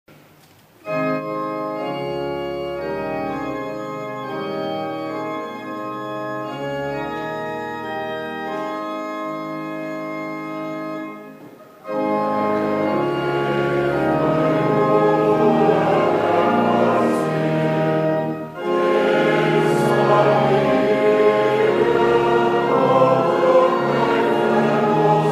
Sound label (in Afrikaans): Gemeentesang